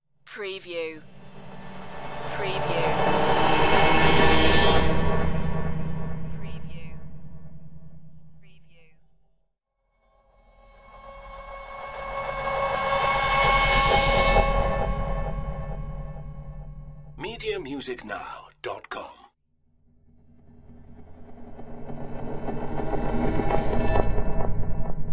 pulsating flyby swooshes

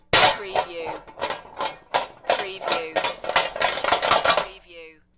Metal Debris Impact Settle

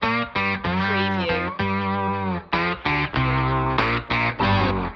footstomp swamp blues stinger

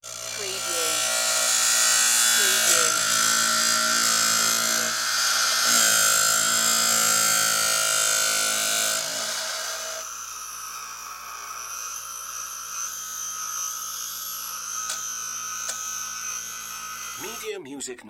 Belt Grinder Grind; sound-effects-bible

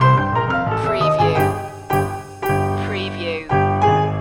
contemporary piano loop

